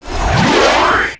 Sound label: Cyclo Bomber Gun